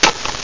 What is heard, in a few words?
Crush Flesh